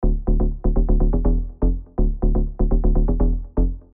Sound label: Bass Spire Deep House